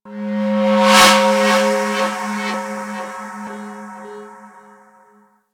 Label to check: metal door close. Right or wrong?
Wrong